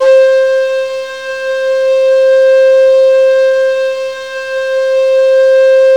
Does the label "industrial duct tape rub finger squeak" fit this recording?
No